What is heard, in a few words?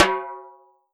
Yamaha RM50 High Timbale